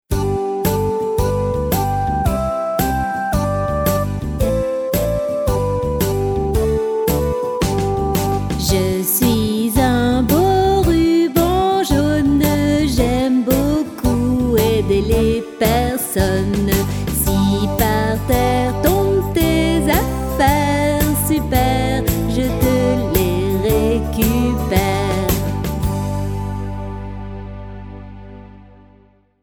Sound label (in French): CHANTE ENFANT SOURIANT, CHANSON; PETRONILLE